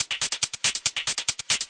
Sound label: 140 techno hat clacker